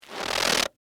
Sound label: Crunch Corn Starch, Bag; Texture; Crunch Sound Effects; Objects